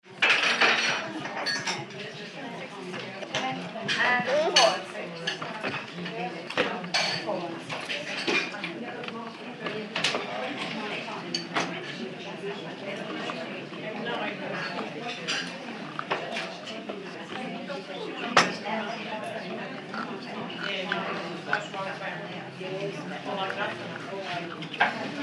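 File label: English Tea Shop Walla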